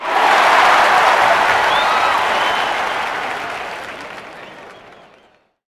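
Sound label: Basketball Crowd Large Cheer; Crowds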